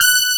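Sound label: brass G5, synthesizer, analog brass; AKAI S6000 CD-ROM - Volume 1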